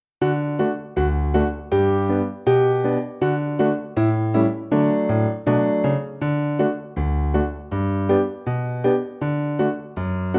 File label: American Folksong Verse